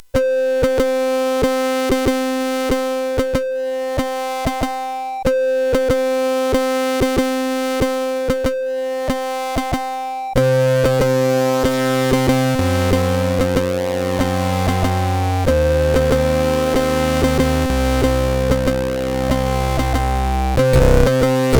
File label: Distorted Frog, sid, 8580; hvsc; Ferrara